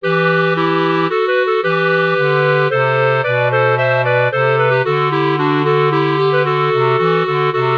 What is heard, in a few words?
for clarinet trio; bach johann sebastian; denn das gesetz des geistes